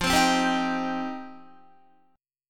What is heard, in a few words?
F 7th Suspended 2nd Guitar, chord; F7sus2; 12,15,12,12,15,15; 4F,4A,5C,5G,5C,6E; strummed